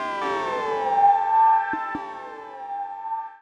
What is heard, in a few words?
KEY SHOT TEKNO 140 A; Techno2; Singleshots